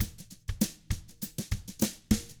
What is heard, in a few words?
FUNKY, Drums